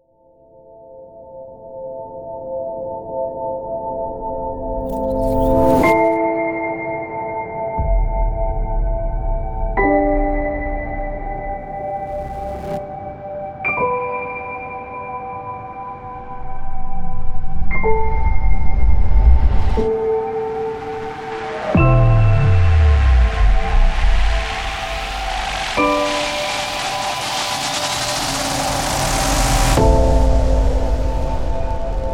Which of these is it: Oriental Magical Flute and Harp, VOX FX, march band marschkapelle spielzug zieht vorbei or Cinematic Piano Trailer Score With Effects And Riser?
Cinematic Piano Trailer Score With Effects And Riser